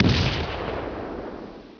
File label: WDK Sm Weap Fire Ball; The War Engine